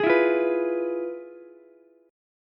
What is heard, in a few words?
F Minor Major 7th Flat 5th Ukulele; FmM7b5 strummed; JGuitar